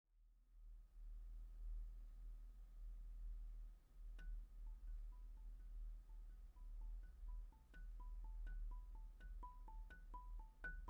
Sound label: Marimba